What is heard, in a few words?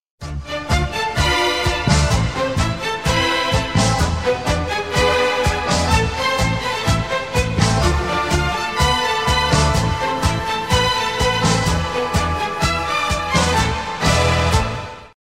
guitar ringtones